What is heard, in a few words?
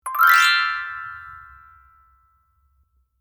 kirarin